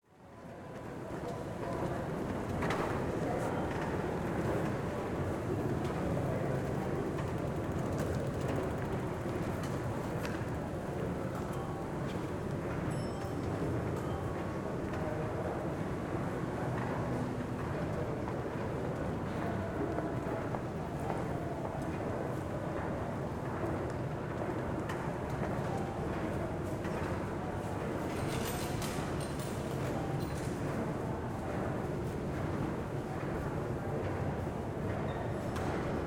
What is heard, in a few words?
Train Station Hallway Escalator, Elevator Bell, Footsteps; 2496SoundEffects; Room Tones and Quiet Places Pack 1